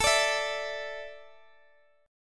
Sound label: A Suspended 4th A Guitar DADGAD